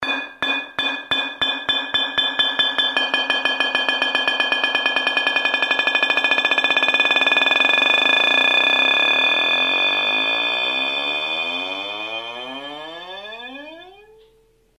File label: Jars knocking